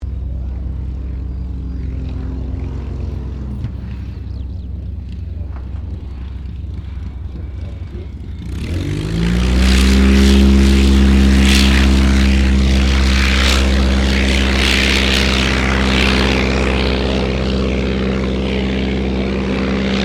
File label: AIRCRAFT DH82A DEHAVILAND TIGERMOTH TOUCH N GO; RAAF Tigermoth; Airplanes; Vehicles